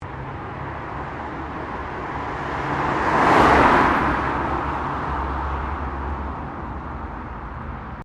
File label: CAR NISSAN 350Z PASSING, manual sportscar; Cars; Vehicles